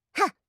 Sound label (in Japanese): 攻撃